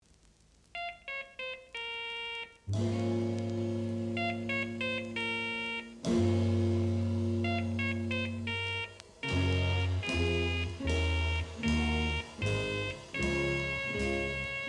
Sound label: piano